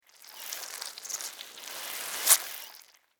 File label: Mosquito Suck, Mosquito, Suck, Blood, Insect, Bug; Mosquitoes; Animals:Insects